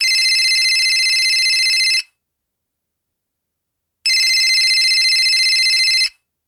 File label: Cellphone Ringtone Huawei Y6 90s Classic Electronic Iphone 3g